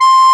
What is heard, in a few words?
RECRD C5; AKAI S-Series CD-ROM Sound Library VOL-8